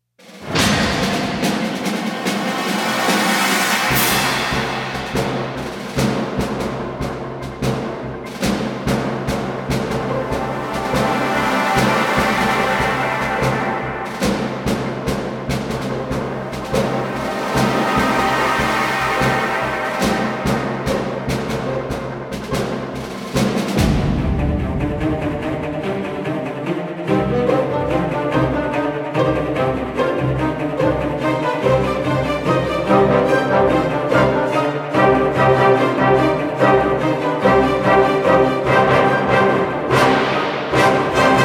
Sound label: Combat Dogfight; Elite Dangerous